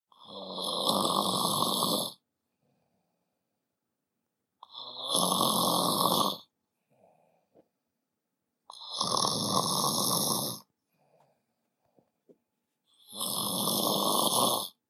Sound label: Granpa Snarkar